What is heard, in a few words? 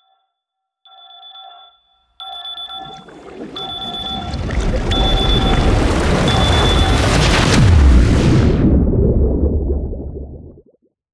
Sunrise Cin SFX Outro; Cinematics